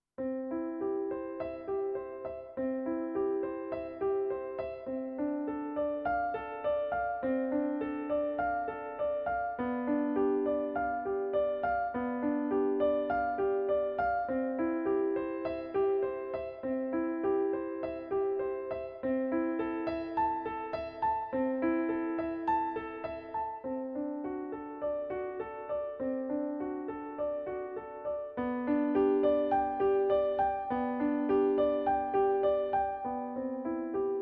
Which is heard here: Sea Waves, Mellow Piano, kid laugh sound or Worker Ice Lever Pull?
Mellow Piano